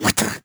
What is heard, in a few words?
teleport; Crypt of the NecroDancer